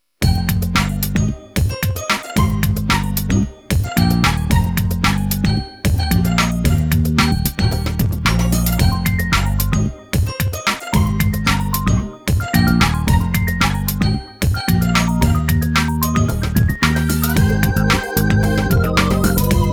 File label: no piano; gentle breeze